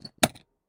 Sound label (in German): Knopf, Der Button besteht aus einem nicht festen Material (Plastik); Drücke den Knopf